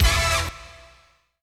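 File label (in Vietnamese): Âm thanh kết thúc đột ngột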